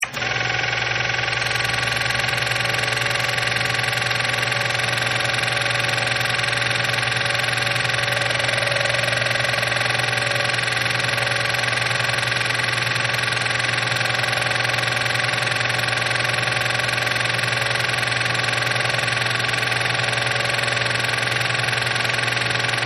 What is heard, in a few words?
Nasal Irrigator High, Nasal Irrigator Running On High Water Pressure Setting; Bathroom (Sound Effects:Household)